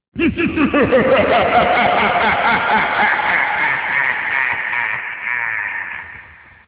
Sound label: Laugh